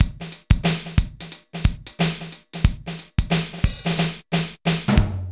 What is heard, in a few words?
bpm 90 amb; AKAI S-Series CD-ROM Sound Library VOL-4